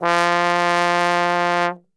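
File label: F bass trombone, Brass Super Section; Best Service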